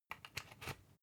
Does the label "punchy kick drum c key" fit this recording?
No